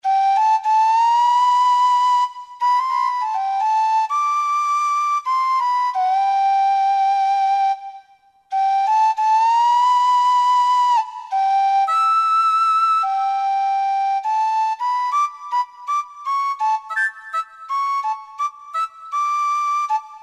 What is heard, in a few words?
Tin Whistle, Roland SR-JV80 Orchestral